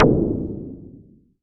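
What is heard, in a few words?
percussion, Roland MKS-80 Drums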